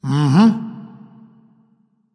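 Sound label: nonverbal agree; smite; voicepack; ullr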